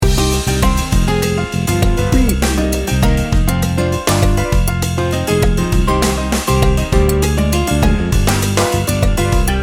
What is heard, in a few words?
salsa loop